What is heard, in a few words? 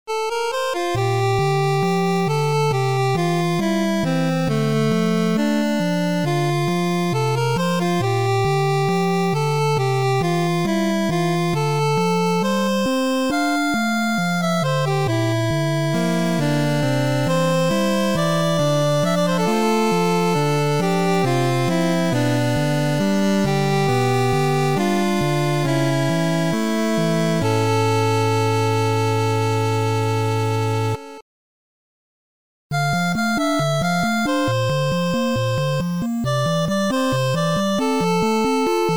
milktea chiptune